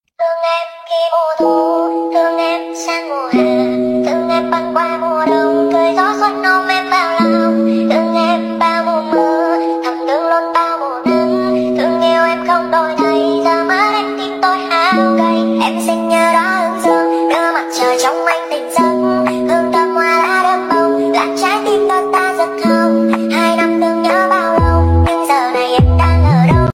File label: Lofi